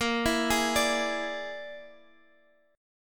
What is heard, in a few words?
Bb 7th Flat 5th, Guitar, DADGAD; arpeggiated; 20,19,18,19,x,x; Bb7b5